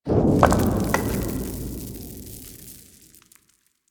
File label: Town Stone Debris, Ambience; Warframe; Duviri